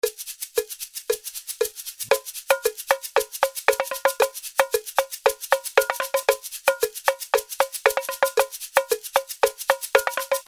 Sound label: Tamborim Ride, samba, Batucada